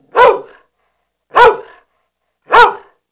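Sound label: dog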